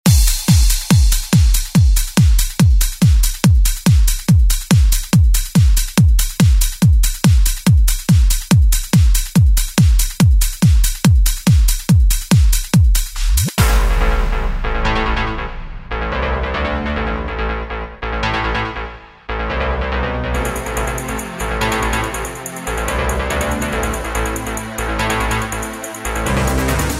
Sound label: 90s ReDrum; DJ Mhark